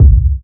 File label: hot steel kick drum sound c key